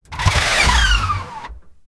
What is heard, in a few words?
high quality wankel engin